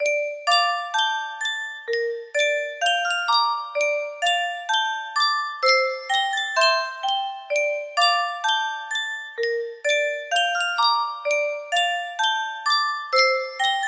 yunsheng music box

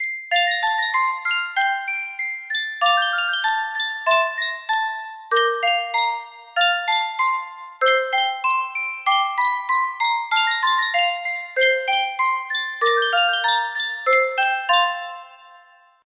sankyo music box

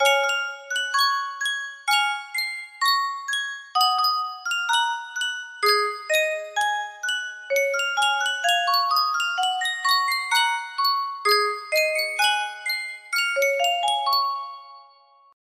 Sankyo music box; candy candy

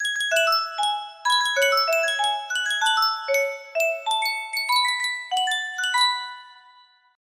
sankyo miniature music box

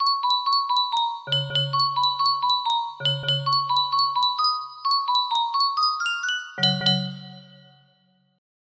jingle, music box melody; cool treats

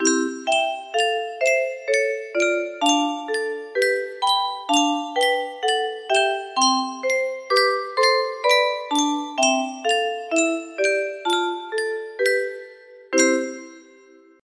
music box, music box melody

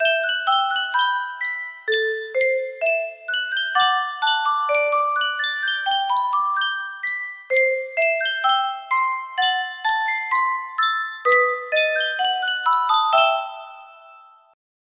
yunsheng custom tune music box

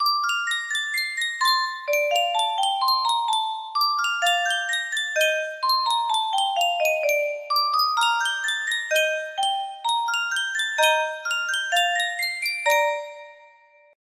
music box, music box melody; sankyo

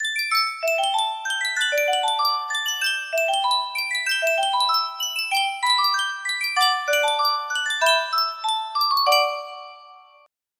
Sankyo miniature music box

